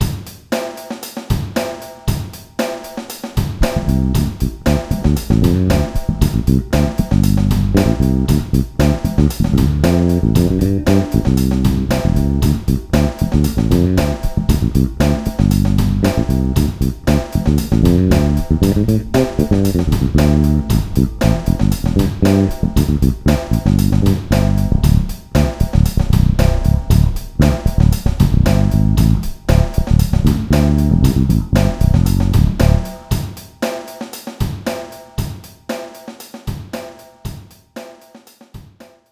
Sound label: groove